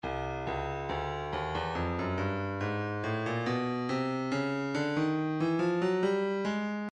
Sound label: breakbeat midi files 070 074 bpm; intimate moment i; Stylus Breakbeat Midi Files